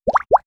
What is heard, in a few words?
end chat